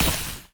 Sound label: Enemy Attack Puddle Hit; bloom minigame